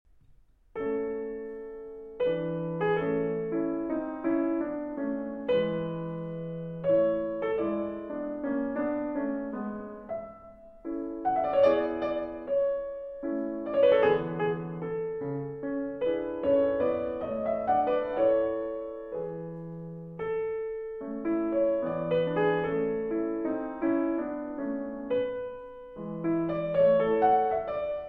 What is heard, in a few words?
Piano Sonatas in D Major Rondeau en Polonaise